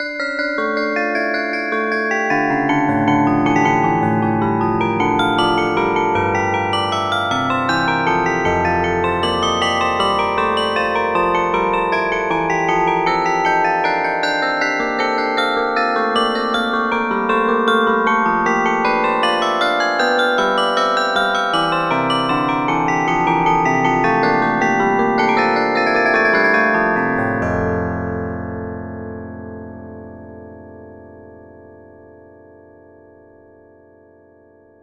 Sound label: Timbrel, carillon